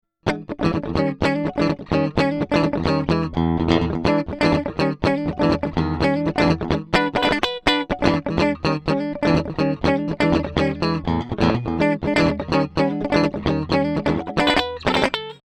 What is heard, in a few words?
Black Lion Audio Auteur E Guitar DI in